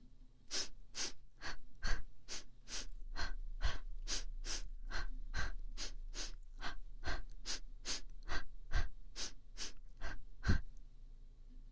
ランニング呼吸左; 呼吸音足音なし; 左耳用

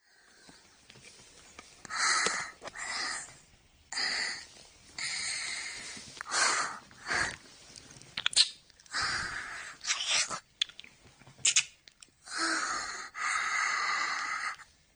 耳舐めしながらオナニーする変態美少女のエッチボイス

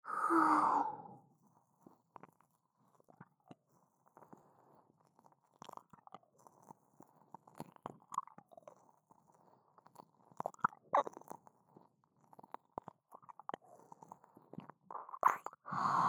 右耳舐め

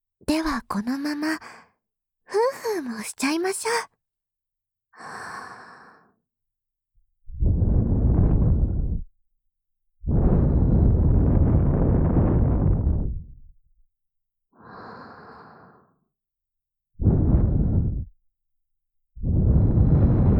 耳吹き右; 後日談

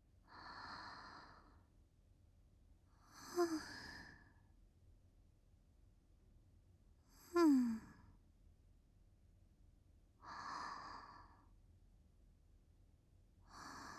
寝息; SEあり

